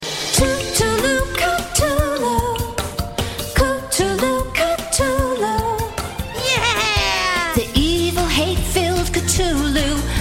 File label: Chant